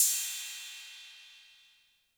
Cymbal 808 Tape Decay, Clean

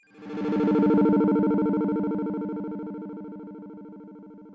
wave warpz

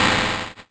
Hihat Open FX

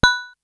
Synth, Techno Blip

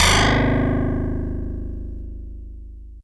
Harpsi FM DX100 Gs0

